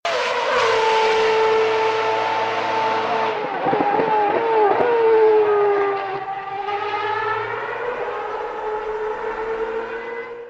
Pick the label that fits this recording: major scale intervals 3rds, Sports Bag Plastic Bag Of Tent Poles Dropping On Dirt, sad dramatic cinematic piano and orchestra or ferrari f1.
ferrari f1